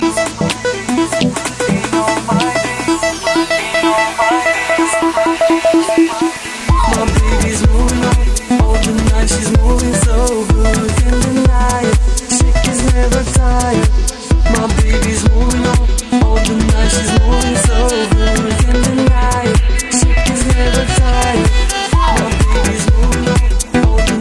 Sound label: house club, dance